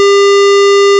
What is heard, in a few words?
square